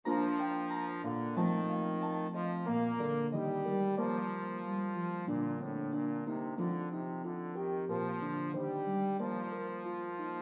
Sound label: Harp Melody Instrument Trombone